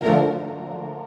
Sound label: Stab Strings Phased